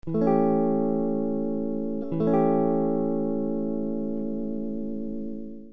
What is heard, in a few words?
Easy Vibe